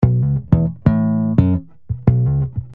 BassSlap Gb 088

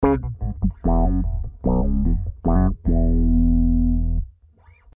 E 149 Wah Funk

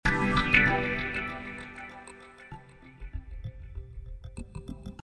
Swinglefunk C

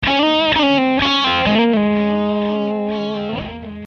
Ab3 125 solo

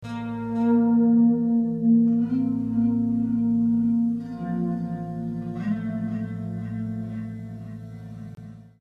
Bass Amb Bb,A 109

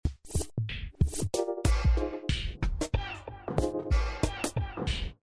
Funky Hopper F7 93

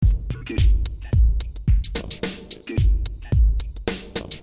Dooie Bdat 109

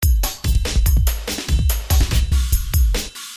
DnB Variations

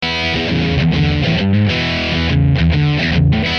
E3 133 rhythm

